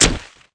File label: c4 plant; cstrike; diliulsound